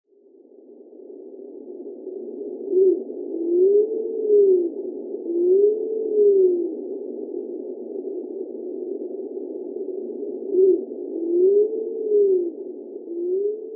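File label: Japanese Wood Pigeon; karasubato; kitakyu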